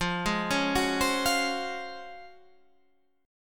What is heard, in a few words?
Db Major 11th F